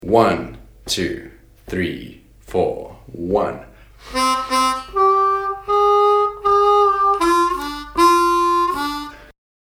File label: Lick, harmonica